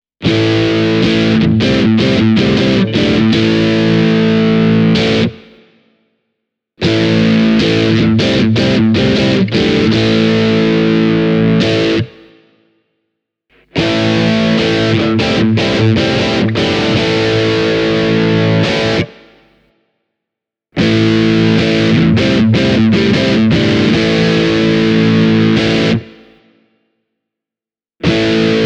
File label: ogre magnox m1 duncan pus dirty